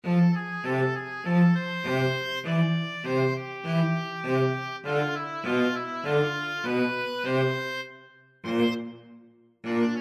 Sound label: 24th st rag, oboe cello duet